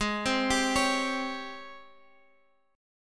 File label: G sharp Major 11th, Guitar, Drop D; 18,15,17,18,x,x